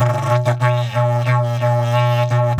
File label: Didgeridoo; DIDGEHORN2